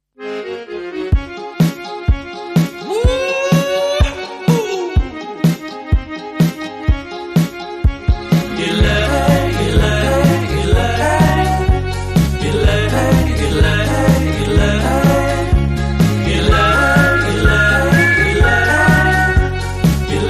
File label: polka